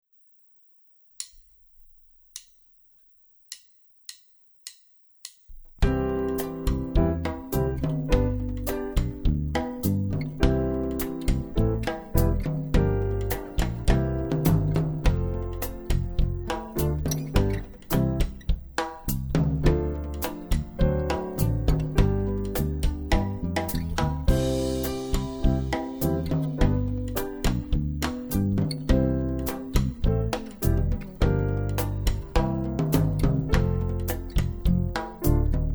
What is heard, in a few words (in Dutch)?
Legato(on) en staccato(on)